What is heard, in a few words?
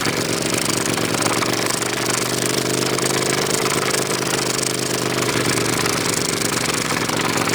Rolling Drone Turret Loop